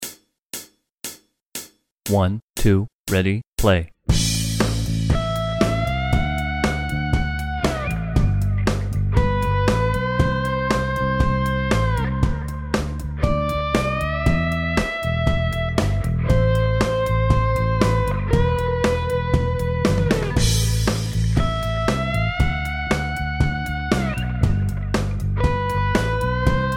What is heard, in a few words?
full band rhythm only